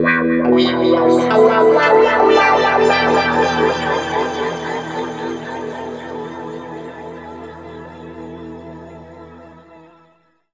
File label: E P-S WA2-L, Guitar; East West; Steve Stevens Guitar Samples Collection; PTCH-SHFT+WA